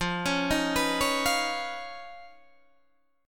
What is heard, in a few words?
D sharp 7th Suspended 2nd Sharp 5th F